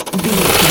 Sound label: gun auto barrel spin fast start